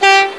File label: Klaxon, Train; OpenBVE